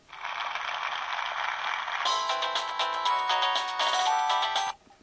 samsung sghe700 ringtone

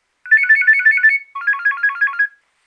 nokia3100 ringtone

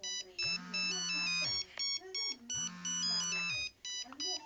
nokia 5510 ringtones

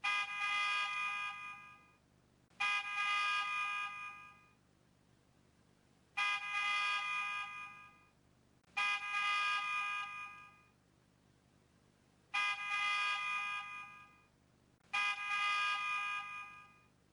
nokia 1200 clogged ringtone

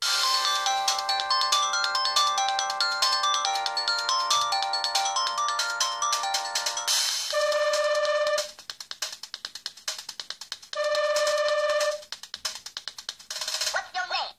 drum n bass ringtone; samsung sgh t100

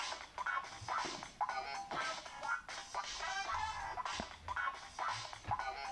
funky all, ringtones

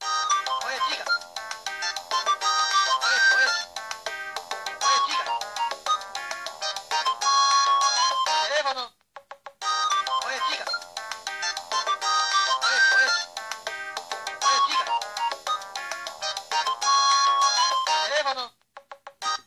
salsa ringtone, samsung sgh t100

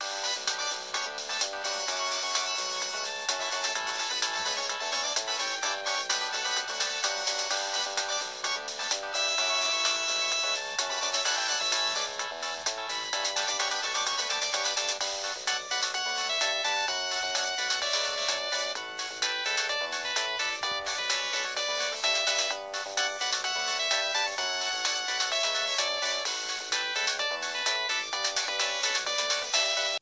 chatshow ringtone, samsung sgh t100

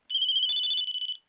ericsson t39 ringtones

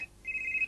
motorola microtac 9800 ringtone

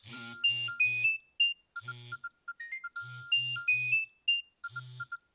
nokia 6100 ringtones groovy blue

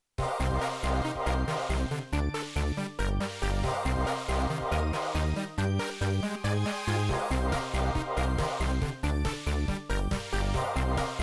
nokia 1110 ringtones strike

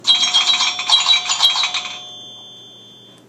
pinball ringtone; apple iphone 3g 4

